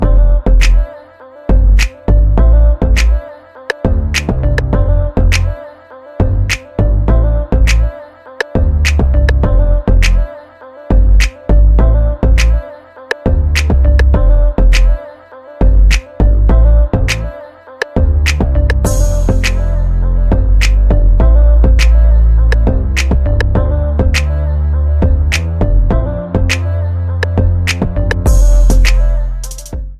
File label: instrumental ringtone